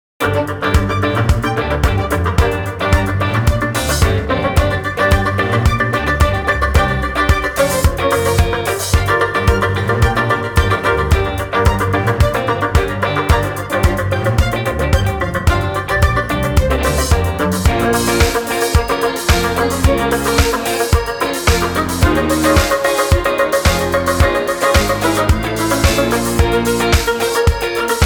Disco Dance Party; 10 Second Ninja X; Greatbeard

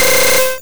Aurora Beam; Pokemon; Gen 1; attack moves; RBY

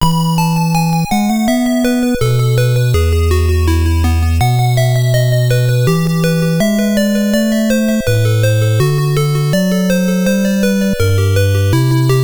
Password BGM; konami famicom chronicle vol.3 rom cassette edition

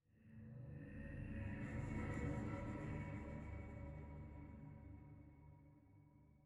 Citadel Ghost Pad

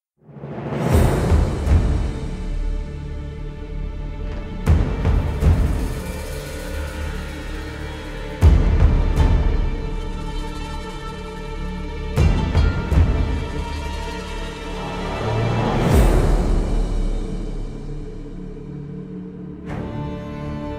Tension; Northmen; A Total War Saga: Thrones of Britannia; macOS; Windows

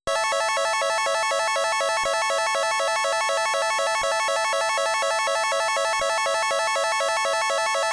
Beam Shot; Phalanx; gaplus

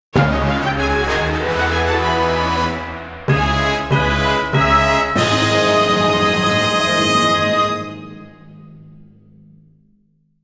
Triforce Fanfare; Legend of Zelda The A Link Between Worlds Sound Selection

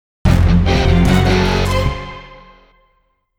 Opening Jingle; Dark Suggestions; Pachislot Akumajo Dracula

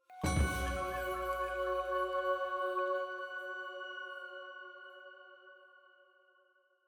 item Jingle Full Choir Flute F sharp

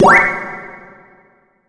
Miss; Pac-Man Championship Edition 2 Plus

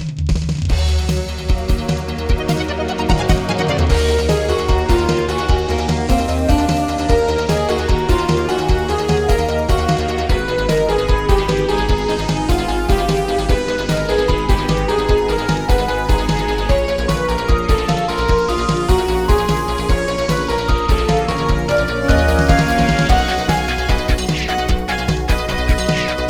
Battle; Emerald Dragon; Glodia Music Vol. 1

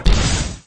Attack Fly

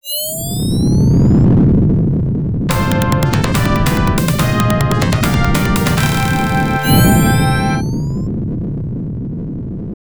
Stage Clear with Effect; thunder cross; arcade

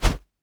Swing Punch; Afterbern Democralypse; Windows

